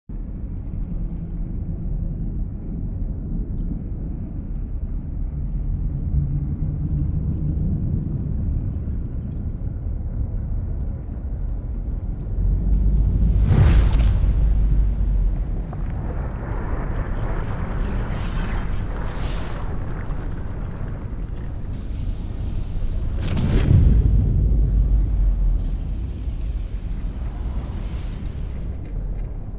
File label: colossus open; aquatics